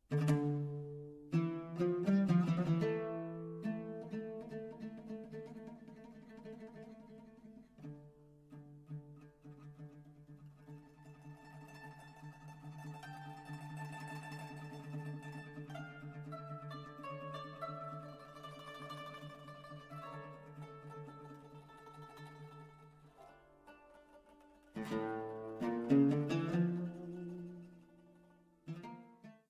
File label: Sayyid Chant and Dance